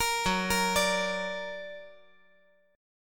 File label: F sharp Augmented F sharp; F#+ arpeggiated